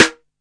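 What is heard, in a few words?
classic hip hop Snare; Classic Hip Hop Mega Kit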